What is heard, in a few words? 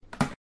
button push